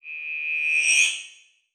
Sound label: Multimedia Internet CD-Rom Flash Beep Electronic